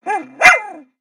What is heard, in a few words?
Pet Dog Robotic Happy; creatures; pets; Lego